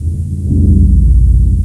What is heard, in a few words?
Menu Popup; 250 Desktop Themes; Quake